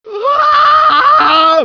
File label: button; svencoop; cracklife; buttons